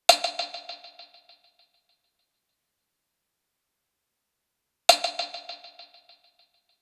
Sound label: perc loop 100, Pop, Drums; Loops; Slate; SD PASADENA Pop Sample Pack